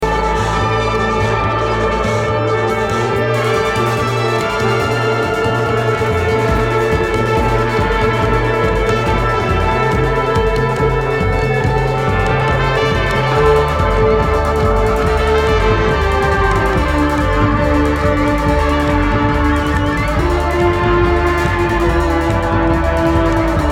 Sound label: house music